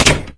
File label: mine deploy; weapons; svencoop